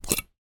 medical hospital thermometer slide out of plastic case; zapsplat